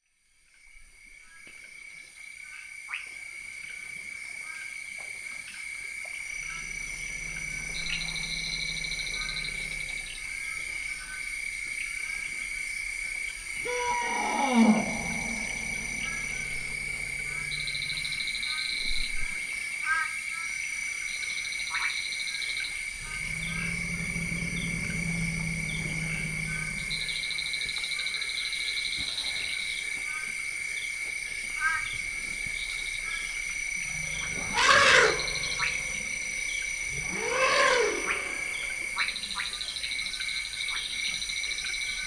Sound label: Elephants, Dzanga Bai, Central African Republic